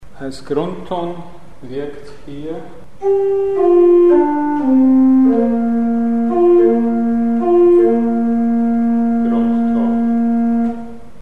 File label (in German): Grundton in Pentatonik; Mikrobuch